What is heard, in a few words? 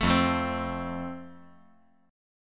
F Major